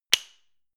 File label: click wood generic